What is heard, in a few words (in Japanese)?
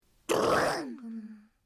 マントをバサッ